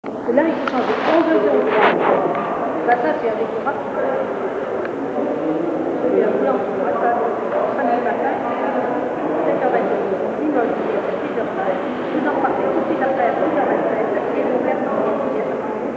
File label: shrine dictorphone mexico, street recording